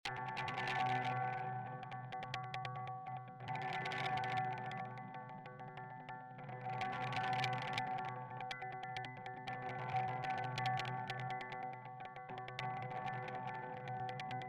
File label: Double Reverb sample